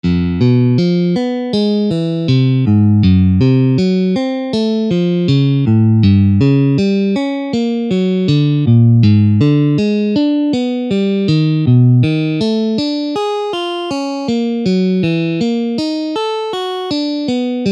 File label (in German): Die Spinne diagonal; pfadfinden